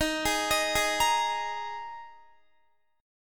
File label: D sharp Suspended 4th, Guitar; arpeggiated